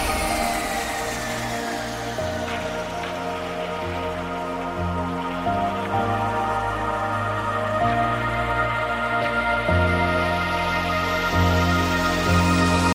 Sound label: i phone x s sky ringtone